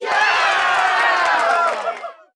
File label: crowd of gamers; game of games